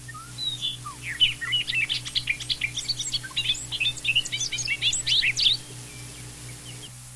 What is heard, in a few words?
bobolink